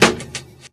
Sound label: Snare Hat Chop, drums